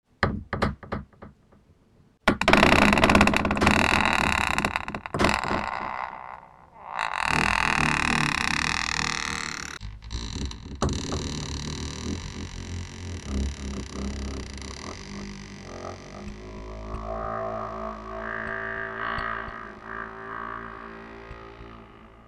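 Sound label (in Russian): zhutk skrip dver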